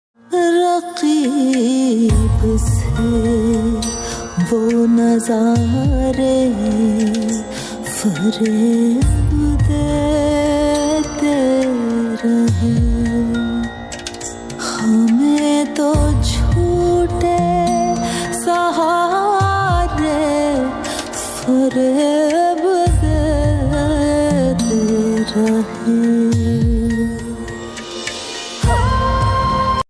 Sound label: Ost pakistani ringtone